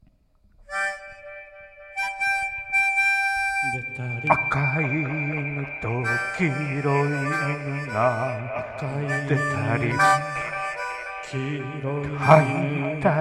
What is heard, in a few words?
dogs